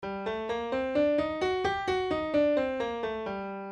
Соль минор ГАРМ.

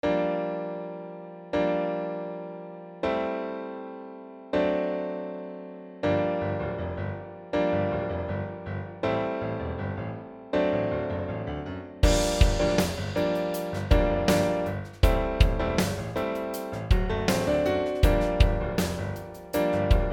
cepochka akkordov sol sol lya re

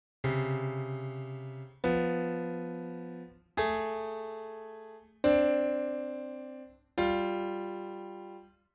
dissonans

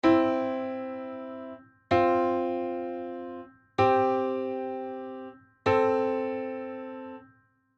konsonansy

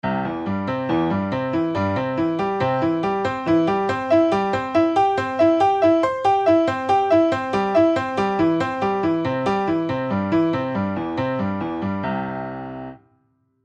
короткие арпеджио

